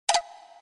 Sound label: error